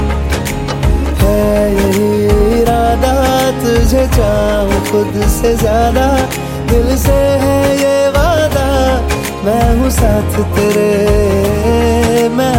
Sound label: Hindi Ringtone